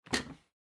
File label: Vacuum Cleaner Lid Open; 掃除機; ふた 開ける